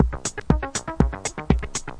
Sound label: 120 bpm hydroponic chronic techno